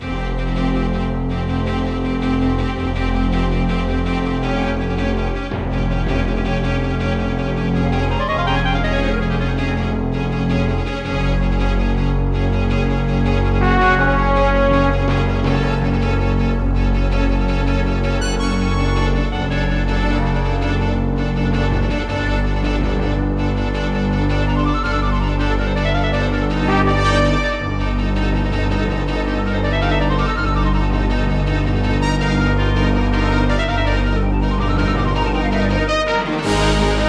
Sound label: pirates battlemusic; shipfight